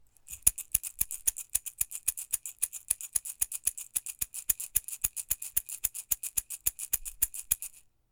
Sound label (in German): holzrassel